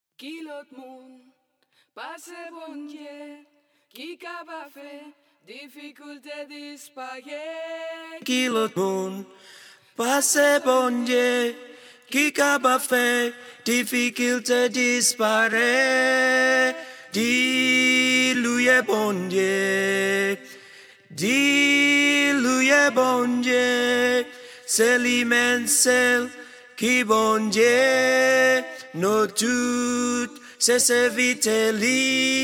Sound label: tenor